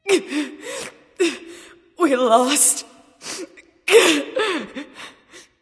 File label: boo hoo; smite; voicepack